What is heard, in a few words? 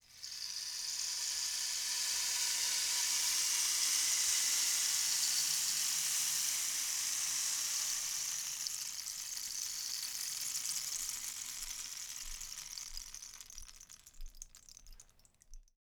Rain Stick; Sonic Foundry (Sony Creative Software); Joe Vitale Latin Percussion